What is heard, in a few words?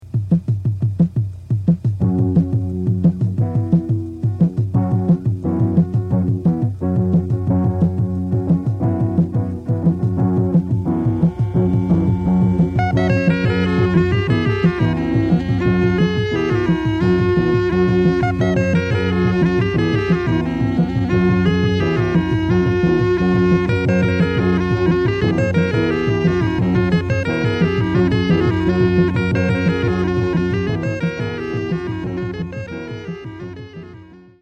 Boogaloo Bagpipe